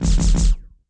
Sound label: Shell; Shells; MicromanÃa 35